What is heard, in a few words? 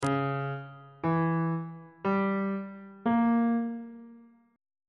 c sharp diminished 7th chord on bass clef